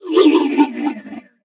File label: robot synth; Source Engine; Portal 2; robot parts